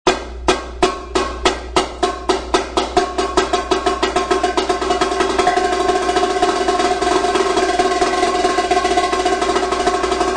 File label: winter tenor, drumline